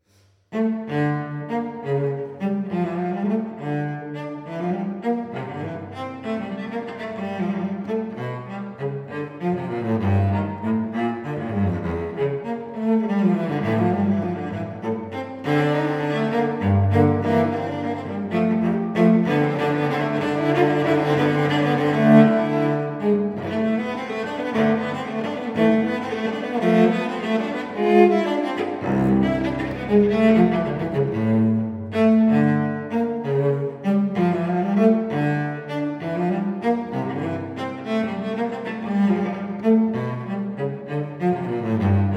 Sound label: Suite in D Minor, Gigue; Suite No. 2; BWV 1008